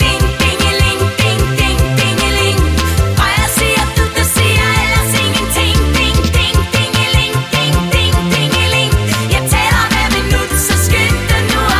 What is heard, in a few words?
Ding Dingeling